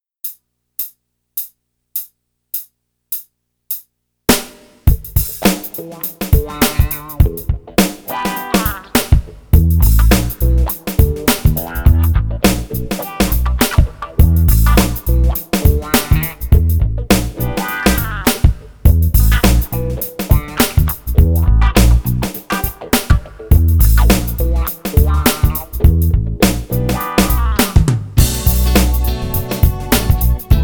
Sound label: With Cello No Lead Gt No Sax Solo Key Of E Minor